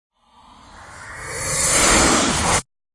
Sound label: Storms Meteor